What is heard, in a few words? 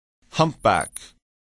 humpback